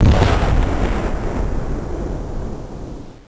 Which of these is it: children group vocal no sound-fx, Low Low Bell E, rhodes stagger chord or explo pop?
explo pop